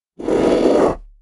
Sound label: shadow attack; Paradise; shadowdemon